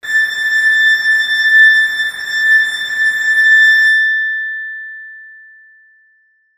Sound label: string model